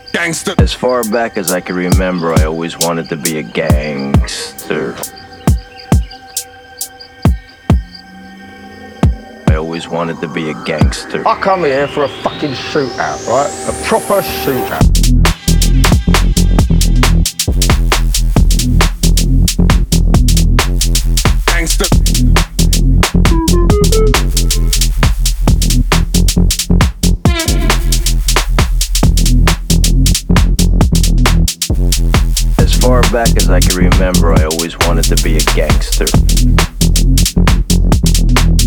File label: UKG